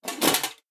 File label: armor hit; minion battlefoley; smite; npc; roman archer